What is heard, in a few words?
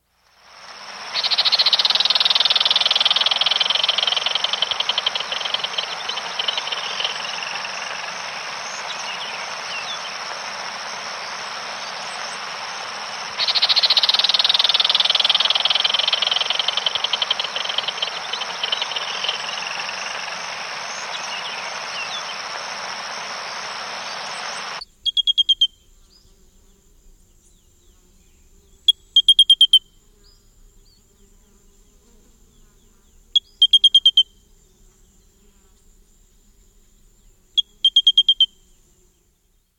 Gray-Breasted Crake; S. America